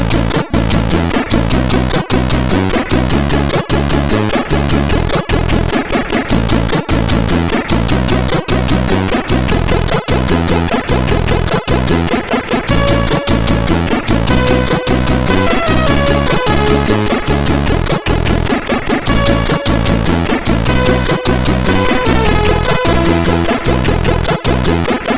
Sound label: Simple Sample, sid CSG8580R5